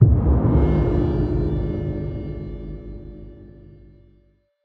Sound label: Stingers Sting Pain